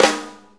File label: 4-4 Snare Flam, Drum Loops